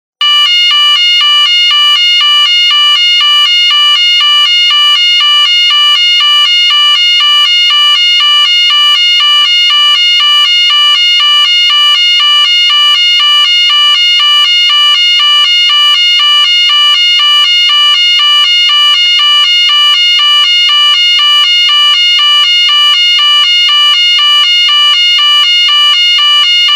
two tone 588hz 714hz, sirene; DP3000

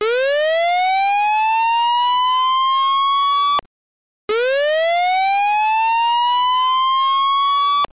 slow whoop slow ascending low to high repeated; DP3000

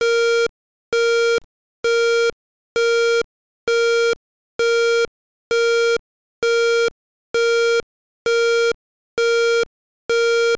beep slow intermittent horn; DP3000

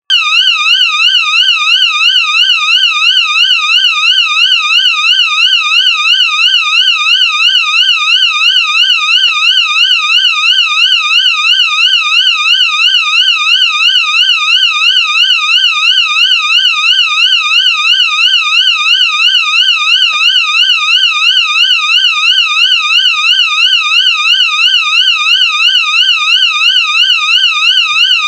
swept 1 6khz 1 2khz 2 6khz, sirene; DP3000

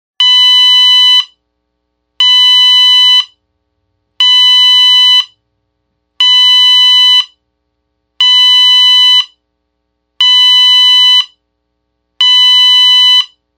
series khz, sirene; DP3000

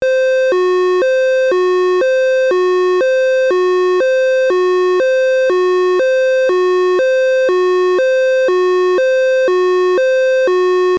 alternate high and low, sirene; DP3000

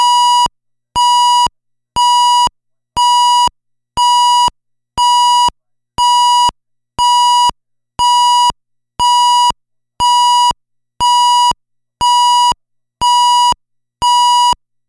euro general intermittent horn; DP3000

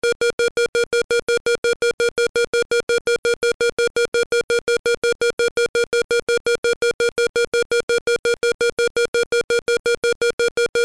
stutter rapid intermittent horn; DP3000

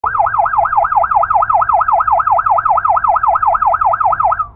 YELP, sirene alta potencia; PRO 207C; incendio; sons RE 207C

